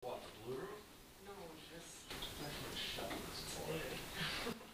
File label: Blue Room Boy Amp